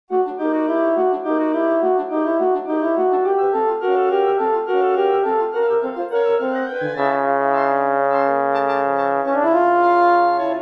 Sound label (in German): Horn